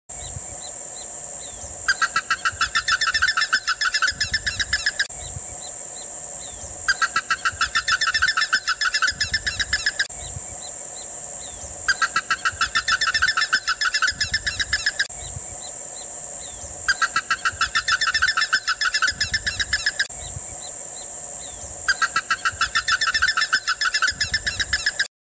canto da curicaca real

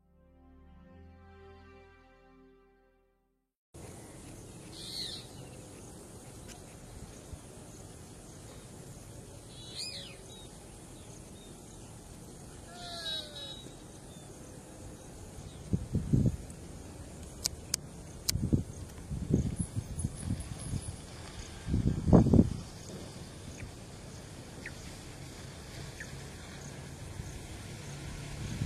canto do cuco jacobino

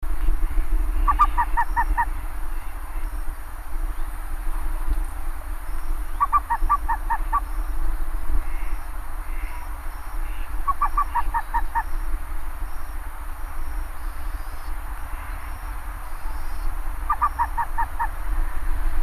canto do tapicuru de cara pelada

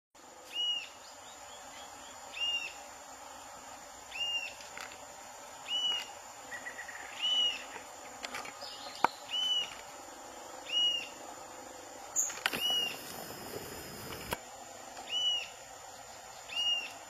canto do falcao criptico

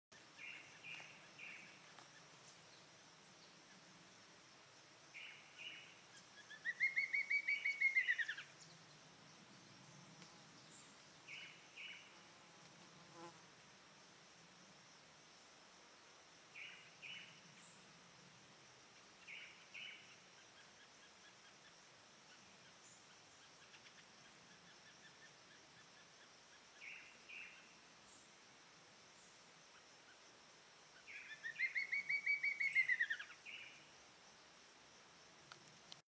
Canto do choquinha de peito pintado

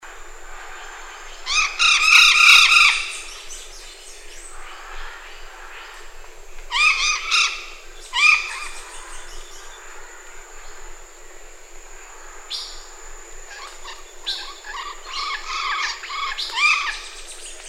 ararajuba Guaruba guarouba

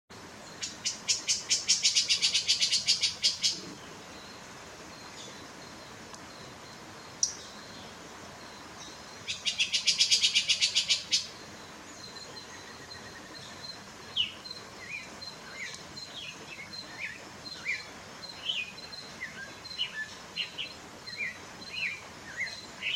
Canto do tie de bando

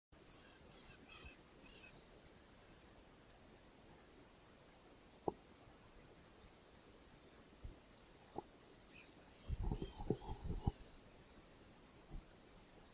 canto da freirinha

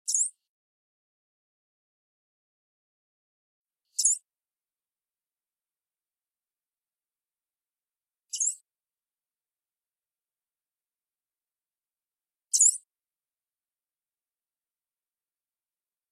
canto do sai andorinha

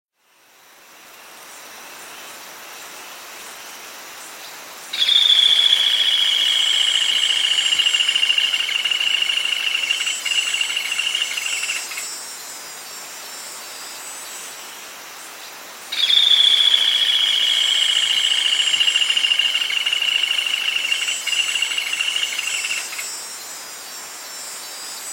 Canto do sana castanha

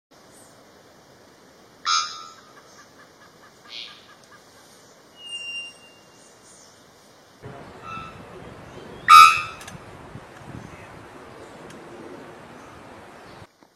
canto da araponga do nicaragua

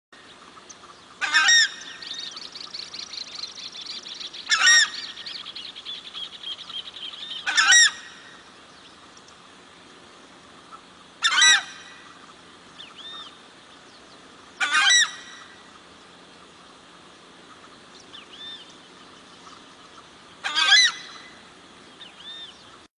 Canto do tocha cinzenta

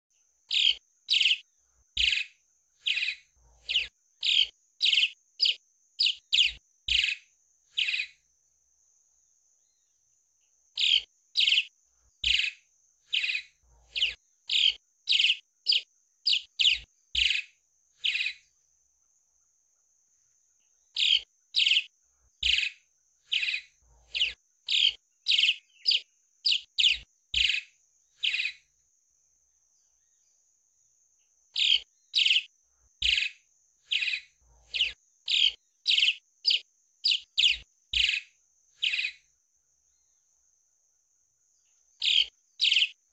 CANTO DA ANDORINHA

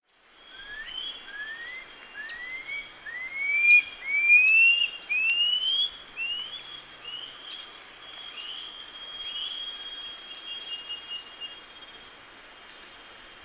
Canto do cujubi

